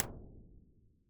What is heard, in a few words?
HIGH DAMPING CAVE, impulse responses